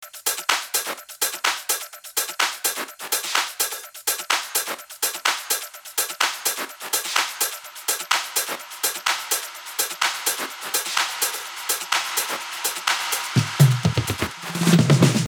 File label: 126 Suspect Tops